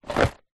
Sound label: food candy inside card box shake movement; zapsplat